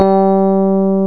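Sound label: electric piano C4; East West; Global Sounds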